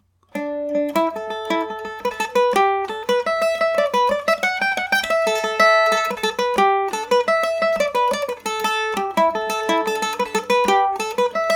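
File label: A dorian md304, irish mandolin; lilting banshee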